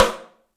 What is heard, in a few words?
STEELS, 3D Drums, ROOM SINGLE